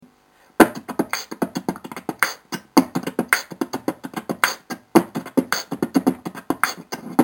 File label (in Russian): битбокс